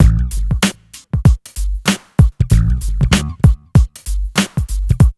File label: MIX, Hip Hop R n B; USB Soundscan vol.19; AKAI